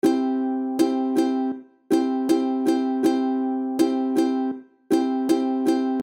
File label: ukulele strumming dduudu; ukulele schlagmuster anfaenger lernen